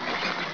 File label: Eggbeater; Snavely! 1.0.4